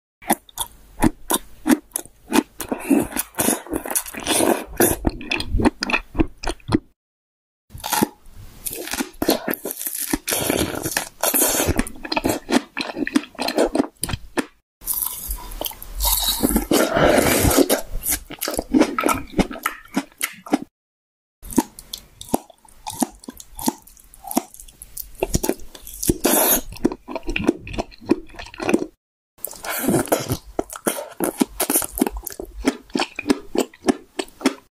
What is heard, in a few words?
Chewing fruit